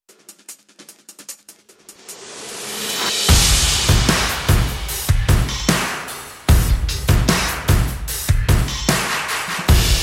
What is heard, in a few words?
essentials cymbals; Make Pop Music